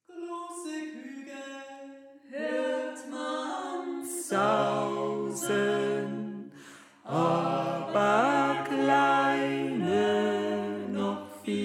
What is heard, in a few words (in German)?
Tenor